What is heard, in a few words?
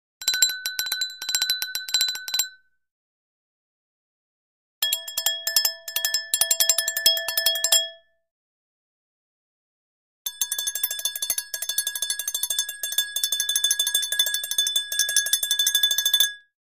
Glass Bells 3 Glass Be